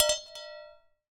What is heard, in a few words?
Strike, Vase